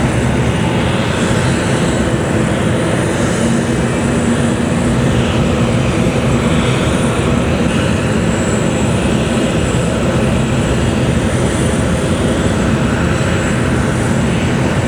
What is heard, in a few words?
airport tarmac